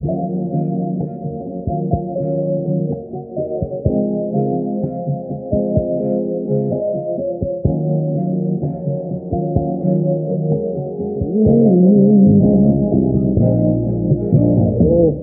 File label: horns and vocals, loops; Phonky